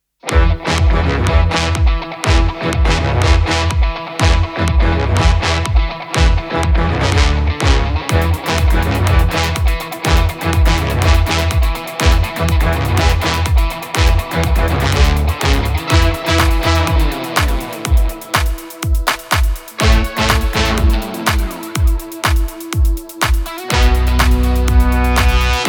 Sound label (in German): Du bist stark Adlerlied PLAYBACK